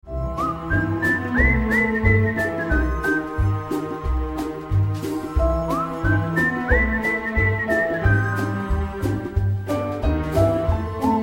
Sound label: Every Dog Has His Day Whistling